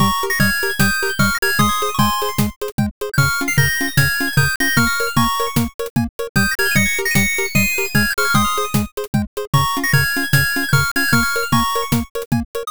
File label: bgm bright